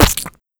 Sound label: Noise Splat